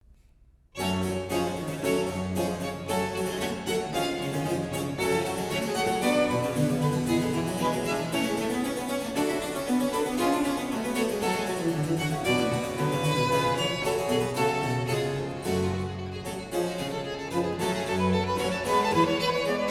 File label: Trio Sonata in G minor Larghetto